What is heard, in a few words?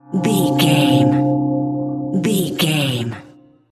magic mirror spell tones